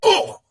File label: Pain; Warframe; Dax Ashigaru; Battle Barks